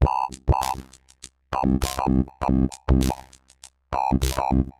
Robo Beat 100BPM, sci-fi Beats

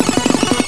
Helicopter, SNES; Revolution X; NON Helicopter Pursuit

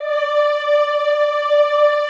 Classical Choir, D4; Best Service; Extended Classical Choir

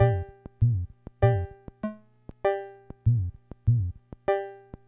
Loop, ct-24; classic machines

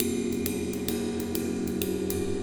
Wind Gong Cymbal Brushes, Ambient Metals; Sonic Foundry (Sony Creative Software) - Ambient Atmospheres & Rhythms

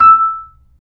B-3, percussion; Keyboards of The 60's and 70's

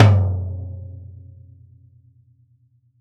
velocity tom; VELOCITY TOM 1

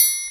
triangle, Percussion; InVision Interactive; Percussion Slam

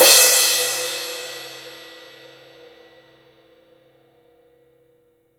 CYM, Real Drum Kit

